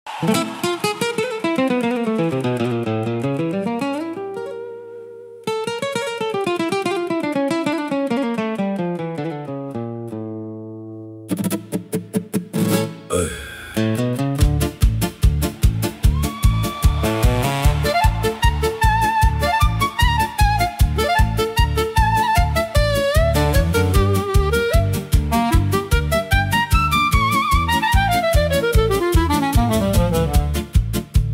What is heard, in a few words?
Ukrainian Music